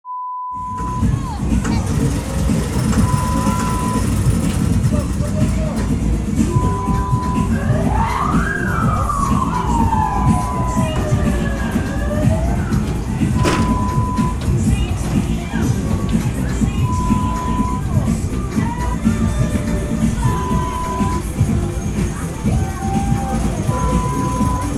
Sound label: Fun Fair sound